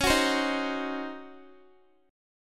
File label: C sharp Major 7th Suspended 2nd Suspended 4th, Guitar; C#M7sus2sus4; strummed